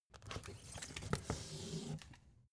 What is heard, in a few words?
take book soft tonal